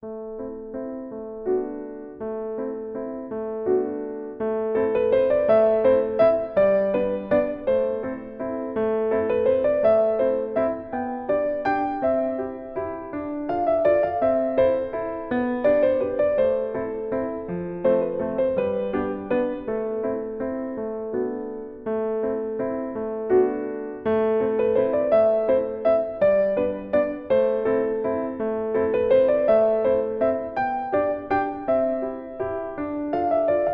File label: Piano version; edge of eternity; Footsteps Piano version